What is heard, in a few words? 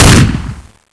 spas12; svencoop; sence; mistake coop